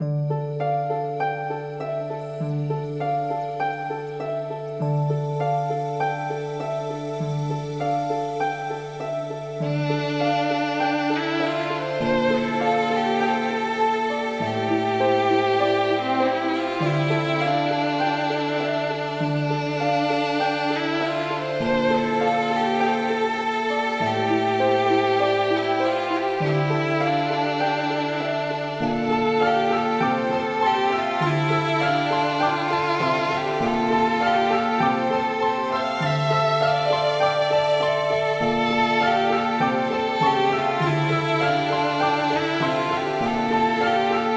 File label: Mimana Theme - Piano Version; Mimana Iyar Chronicle